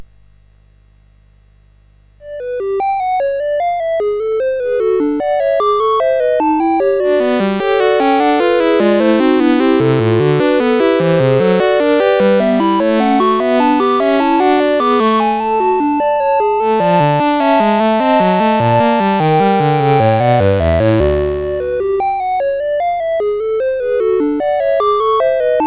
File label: Gigue, CSG8580; Syl